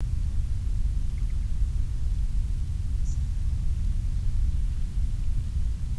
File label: Indigo Bunting